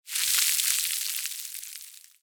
foley cigarette or cigar burn; zapsplat

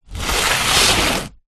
cardboard box large slide garage floor; foley; zapsplat

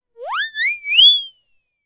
cartoon siren whistle 3 blows increasing in pitch

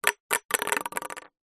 table tennis balls many spill drop table

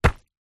footstep single jump land dirt track

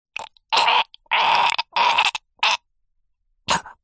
human male strangle choke die vocal; zapsplat

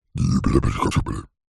horror goblin vocalisation funny; zapsplat

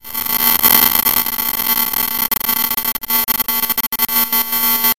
science fiction radio static receiver

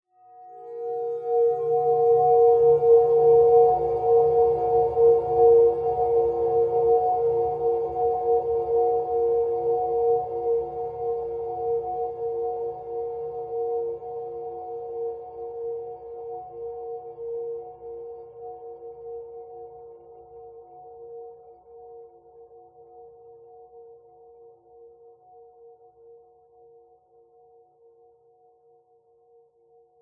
horror swell long ghostly discordant; zapsplat

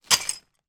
foley broken dish pieces in bag bash; zapsplat

